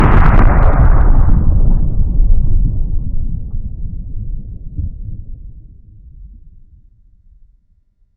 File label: Huge explosion in distance; Hovl Studio; SFX Battle sounds; WhiteMan Unity2D